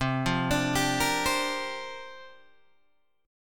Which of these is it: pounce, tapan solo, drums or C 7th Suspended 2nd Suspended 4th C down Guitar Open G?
C 7th Suspended 2nd Suspended 4th C down Guitar Open G